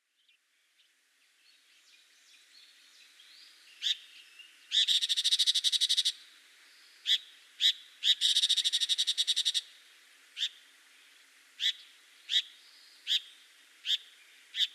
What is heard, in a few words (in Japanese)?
chigomouzu; fukushima